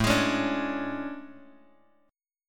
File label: A Major 11th, guitar; AM11 Chord {5 X 6 6 3 X} strummed